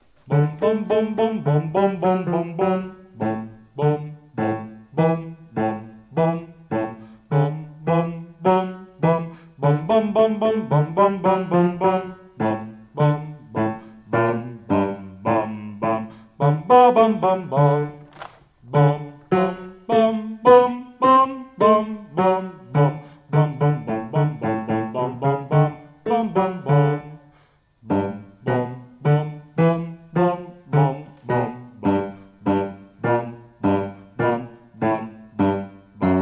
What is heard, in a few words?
hopkele bas; Oefenen; Jiddish ensemble TOCH